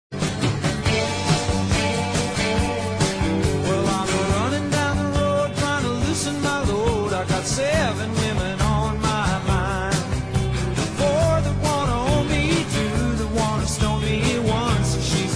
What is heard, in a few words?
vocals